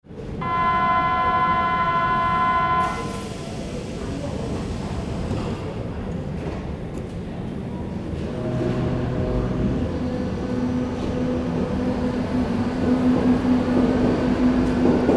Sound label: underground train leaving